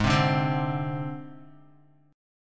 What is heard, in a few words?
G sharp Major Flat 5th, Guitar; G#Mb5 strummed; JGuitar